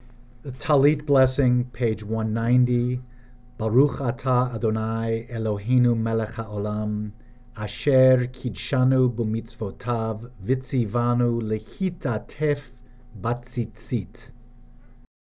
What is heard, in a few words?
Talit Blessing